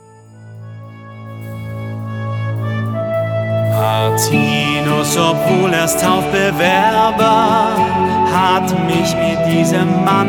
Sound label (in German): Hoerprobe Song